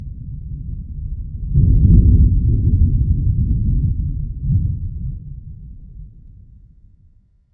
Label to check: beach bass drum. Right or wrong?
Wrong